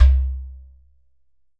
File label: GHATAM